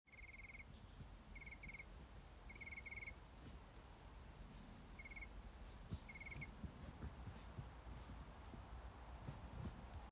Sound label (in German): Weinhähnchen